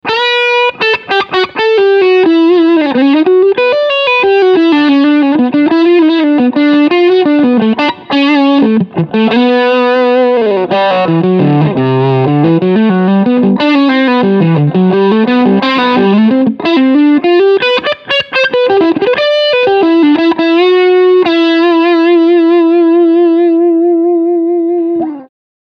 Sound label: Tube Drive Medium